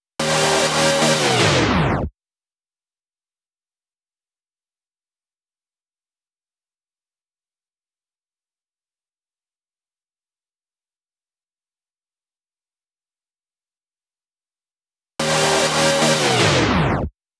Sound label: 128BPM Chords fill In, Synth; Root G#min; Vengeance - Essential Clubsounds Vol.5; Demo Constr Kits; Break the Chains